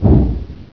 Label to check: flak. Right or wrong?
Right